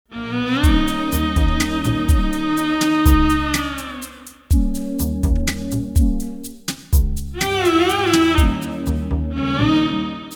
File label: Oriental pub